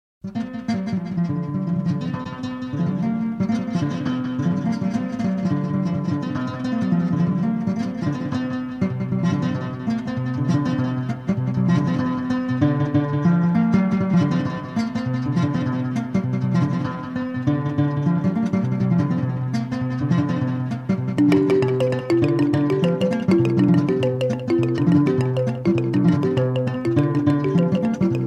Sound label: Desert Blues World